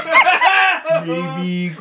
hahaha

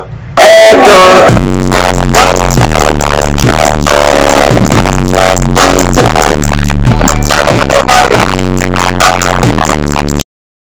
bass boosted

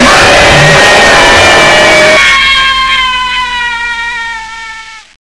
jumpscare sound

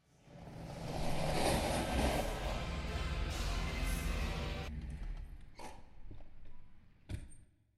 car arrive; cinderella; non digetic

